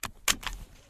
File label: seatbelt remove